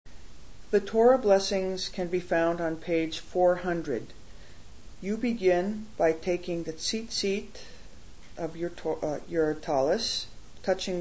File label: blessing before torah